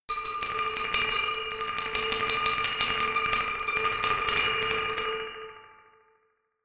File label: glockenspiel dark tremolo low